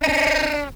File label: Bird Three Chuff; Ambience; Warframe; Entrati